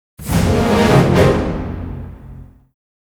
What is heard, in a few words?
Mission Failure Jingle; fire emblem musou fuuka setsugetsu original soundtrack 2023